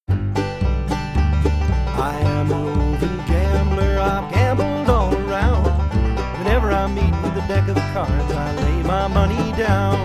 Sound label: Roving Gambler alt bass mix